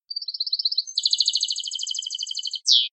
c terminacion; campero

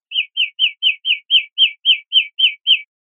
golpes corridos biobio, verderon

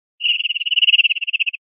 absorbidos, verderon

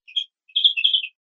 camacholada, verderon

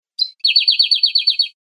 pinzonada; verderon

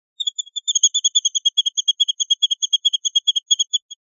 rep tiriteos, pardillo

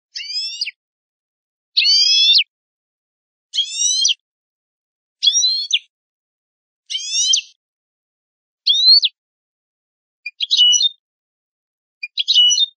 quejas simples

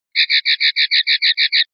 rabieta en reganos, pardillo

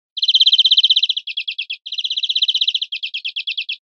camacholada, verderon

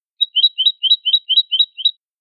boli

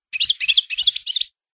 llamada de paillo; campero

